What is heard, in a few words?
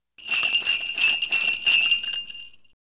bells